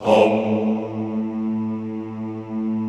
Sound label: HOMMM A1, Extended Classical Choir; Best Service